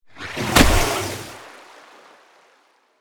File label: Water magic spell large impact